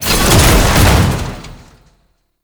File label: Tank Ram Ability; Warframe; Lasrian Tank; Abilities